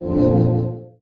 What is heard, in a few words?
Magic